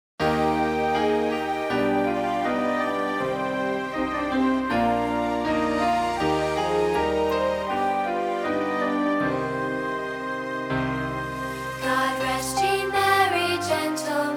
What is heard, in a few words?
Kids, Christmas Carols